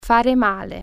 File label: hurt